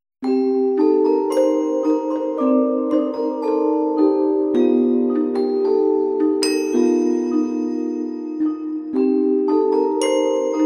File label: Cute Spooky Song for Halloween